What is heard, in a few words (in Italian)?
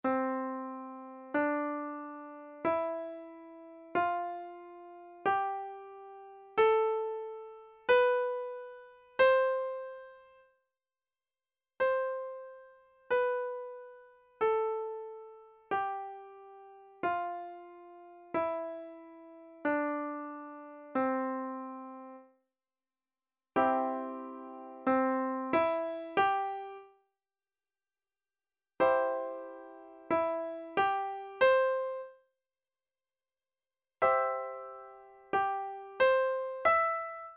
Esercizio sulla scala di DO maggiore